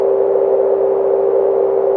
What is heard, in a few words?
matrix load pad; Pads